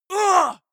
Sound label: male combat hit soft; soundpool